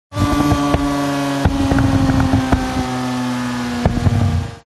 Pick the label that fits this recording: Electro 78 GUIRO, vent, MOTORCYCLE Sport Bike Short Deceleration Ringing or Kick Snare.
MOTORCYCLE Sport Bike Short Deceleration Ringing